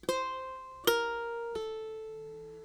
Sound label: pulloff, ukulele